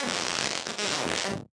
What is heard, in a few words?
Casio, Glitched, malfunctioning synth